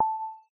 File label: receive im